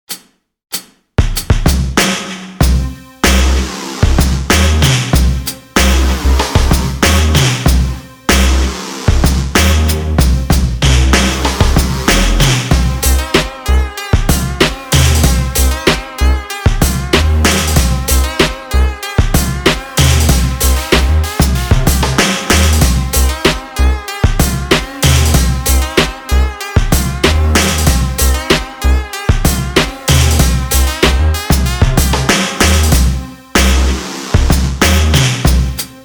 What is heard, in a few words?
95BPM